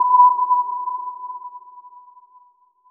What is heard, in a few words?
sonar ping